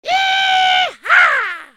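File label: scream YEEHAA; Efekti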